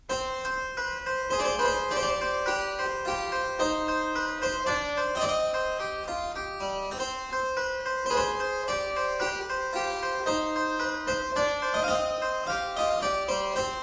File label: Ground in D minor; Colin Booth; Henry Purcell